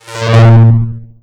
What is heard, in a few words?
shield; epervier-framework; shields